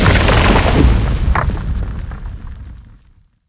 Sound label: tech building fall; propack